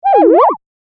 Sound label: Cuckoo; Sony Ericsson; PlusFourSix; Laura (W580)